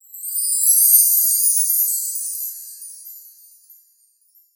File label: completed